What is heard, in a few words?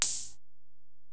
HiHat, Alesis DM-PRO